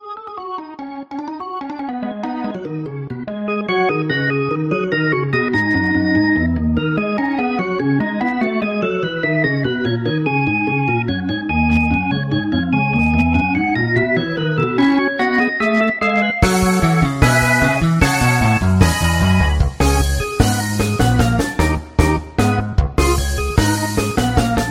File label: Hammond B3 Organ Bright Fast Jazz Slow Overdrive; Distortion; Master Hammond B3; Syntheway